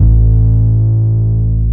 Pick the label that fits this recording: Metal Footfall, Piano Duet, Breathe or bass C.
bass C